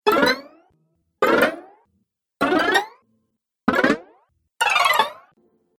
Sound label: Cartoon Game Fast Vibrating Jumps Collection; Cartoon Sound Design